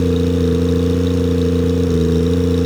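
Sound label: meter drive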